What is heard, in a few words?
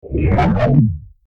time start or stop; FXHome